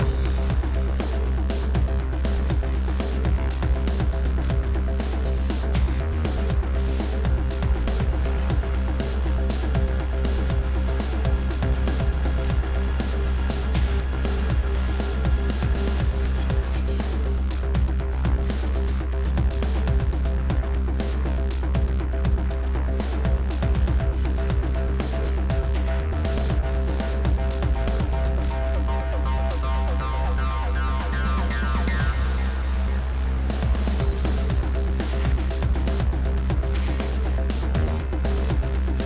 Combat 120BPM

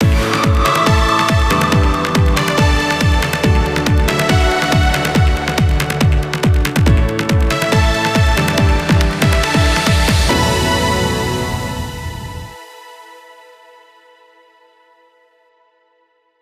Start Elec; Gran Turismo 5

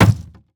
wood hit; Teardown

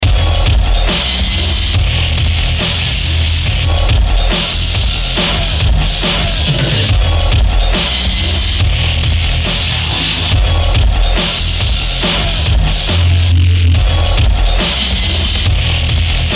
Postmatch; Warsow

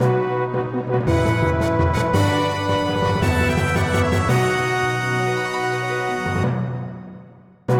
Win Back; Front Mission 1st; PS1

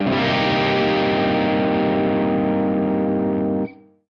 guitar chord; Summer Catchers

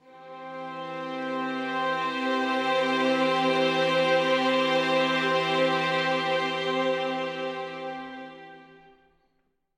Loop String Swells Cm 1st Inv

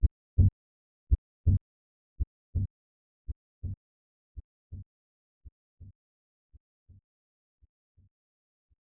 Heartbeat; Appleseed Prometheus no Shintaku; SNES

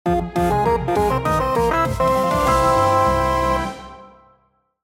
Level Start Jingle; bfdi branches

